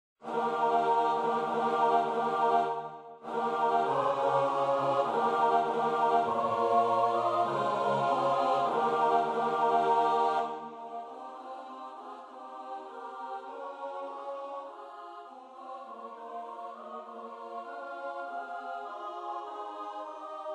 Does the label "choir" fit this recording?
Yes